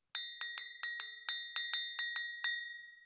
Cloche mandingue; clochesmandingues

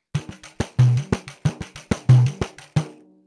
Caixa et surdo

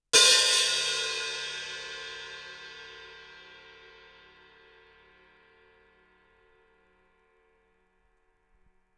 H-H crash baguette ouvert; cymbales

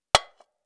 Tamborim fouet battue

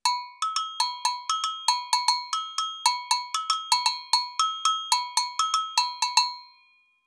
Agogo samba rapide

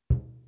Surdo batte main droite etouffe

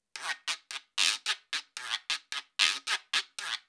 Guiro ternaire

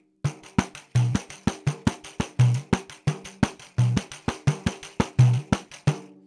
Tamborim et surdo

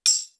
Tambourin sans peau avec baguette

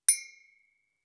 Cloche mandingue aigue; clochesmandingues

